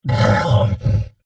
Monster Snarl, Horror, Monster, Snarl, Large, Creature; Monsters (Sound Effects:Horror); BlastWave